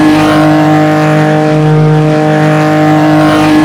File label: EX ON VERY LOW, F1; AOS F1 2022; FSR2018